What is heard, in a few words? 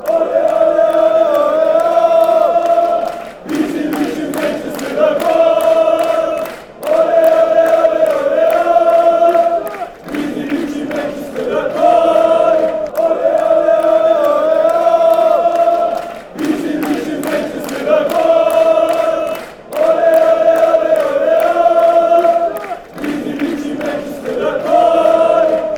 ole ole ole, chants